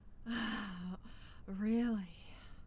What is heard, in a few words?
damage